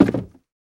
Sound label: wood, footsteps